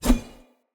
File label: Short Sword Whoosh; Weapons; Swords; Sollos